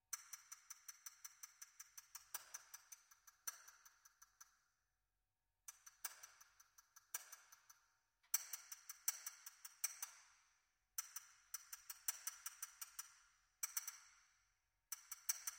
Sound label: For Alto Saxophone; II. Smile